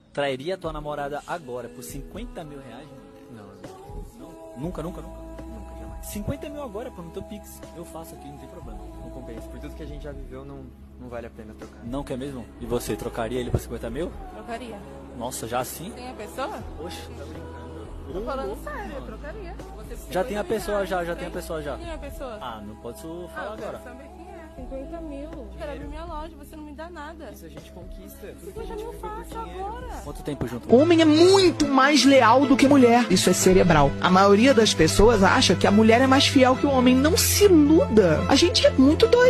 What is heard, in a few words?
drum kit